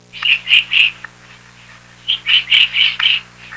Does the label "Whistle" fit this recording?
No